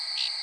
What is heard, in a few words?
Thrush